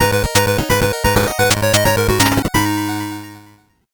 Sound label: 8bit jingle normal